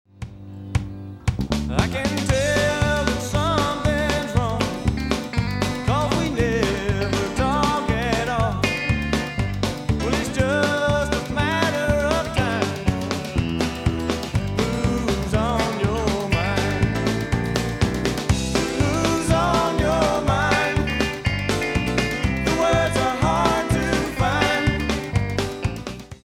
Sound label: too much verb